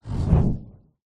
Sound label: magic spell dark evil whoosh eject pass